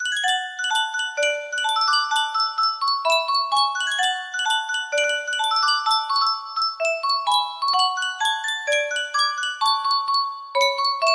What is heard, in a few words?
music box